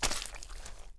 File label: Foot Mud; Civ4 warlords